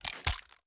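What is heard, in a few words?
pistol raise up; gmod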